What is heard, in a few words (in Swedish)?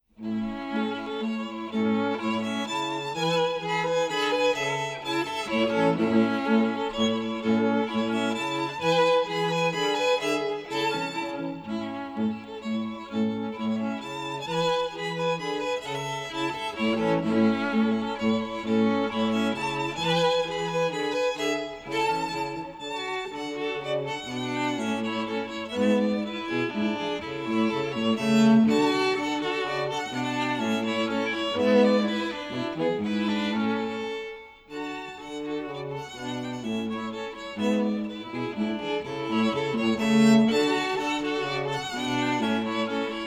Polska i A-Dur